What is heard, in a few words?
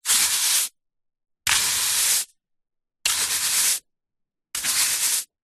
household broom brush hard bristled sweep concrete ground med strokes x4